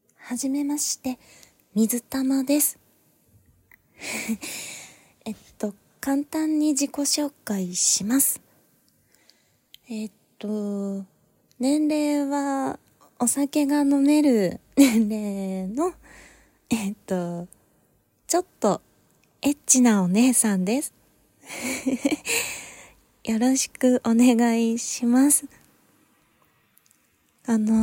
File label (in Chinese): 口交音; 降噪